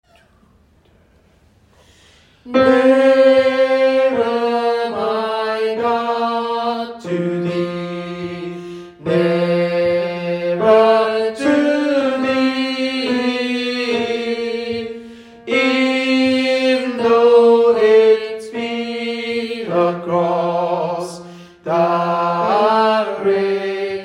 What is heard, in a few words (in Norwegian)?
tenor, gospel; Nearer my God; kongsberg; kor; gospel for alle